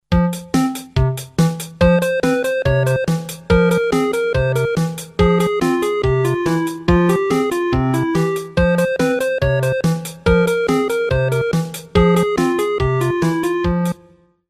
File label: 8bit theme